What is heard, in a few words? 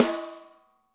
dry, snares; Spectrasonics - Burning Grooves CD2